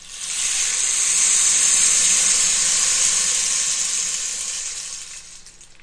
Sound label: rainstick stick long; ETHNIC and WORLD PERCUSSION